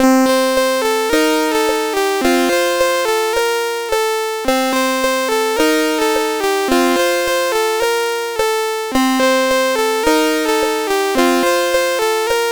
Countdown, CSG8580R5

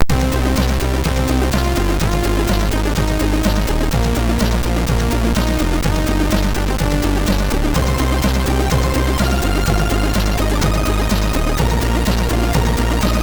Intro sid MOS6581R3; Level99

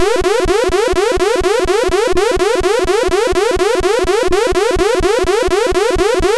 Trance, MOS6581R4; Trance Sector Ultimate

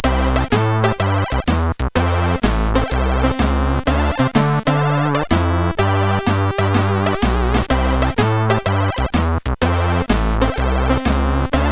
Jazz, CSG8580; CSG8580R5

